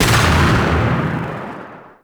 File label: Battery Charged Shot; Cowgirl